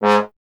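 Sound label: bass trombone G#; Miroslav Vitous; Solo Instruments VOL-1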